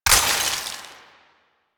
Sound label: Ammo Pickup Cold; DE; Warframe; Warframes; Frumentarius; AmmoAbility